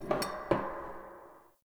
Bar Glass Move; Nineteen Ninety Nine; Hub; Hub Pub

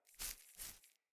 Grunt Foley Active Idle, Enemy; Characters; Corrupted Sproutfolk

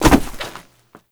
Hit Ledge; Foley; Warframe; Characters; Tenno

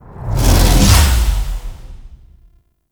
Hardened Wellspring Cast; Warframe; Focus; Zenurik

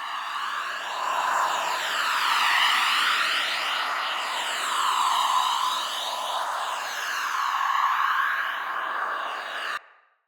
Soul Ability Energy Amount Breath Loop

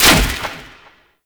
Orb Destroy; Warframe; Duviri; Conservation

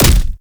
Punch; Fulmin Prime; Weapons

